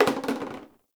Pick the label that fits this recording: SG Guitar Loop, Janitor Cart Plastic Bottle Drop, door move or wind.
Janitor Cart Plastic Bottle Drop